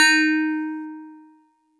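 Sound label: StarPiano DX100 D#0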